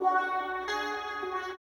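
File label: guitar, right; East West - Phat & Phunky CD2; 90's Sample CDs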